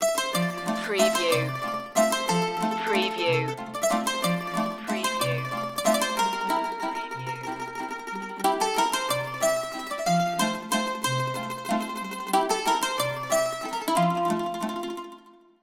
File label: carnaval de paris